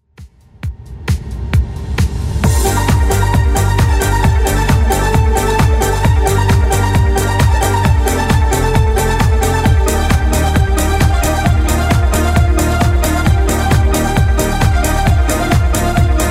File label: 90s 133bpm